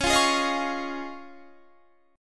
Db Major Add 9th, Guitar Standard; strummed; 21,20,18,20,18,21

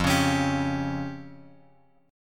F Suspended 2nd Sharp 5th, chord; Fsus2#5 Chord {1 4 X X 2 3} strummed

